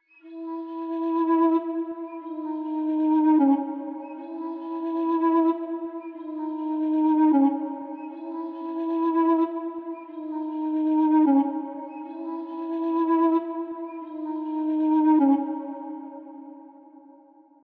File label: 122 BPM C# Min Flute Low; Melodies; Cymatics; 2022 Melody Mystery Expansion; Chain